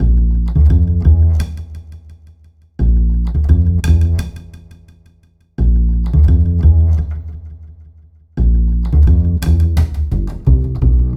Remixed Acoustic Bass

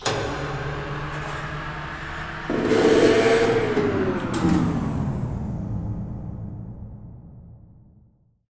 sci fi; Masterbits; Switch FX

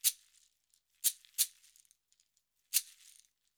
089 FREES, Modern Percussion Loops

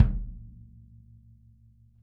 kick

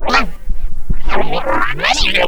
scratch, Funky Vocals; USB Soundscan vol.18; AKAI; 90s sample CD; FX DELIR

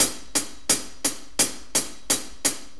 4-4 HiHat Loop; 4-4 Drum Loops; HiHat Ride Loops; Sonic Foundry; Drum Tools

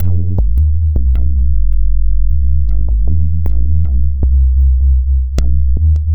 Synth Bass

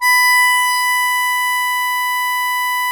HARMONICA B4; Sonic Reality; American Heartland

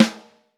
Snare Drum

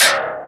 FX Springy; Proteus 2000; Multisamples; Digital Sound Factory Proteus 2000

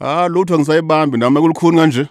Vocal Chirp, African Voices; Quazibeat - African Secret

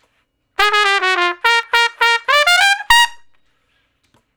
Trumpet Shuffle (Bb); Solos; Crimson Blue and Fabulous Horncraft 4 RnB; Horncraft for R&B

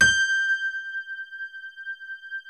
BALLAD G5, E-PIANO; Music Store - Claudius Diemeter Schwerlos

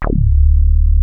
WEST SOFT D1, ANALOG BASS; Music Store - Claudius Diemeter Schwerlos

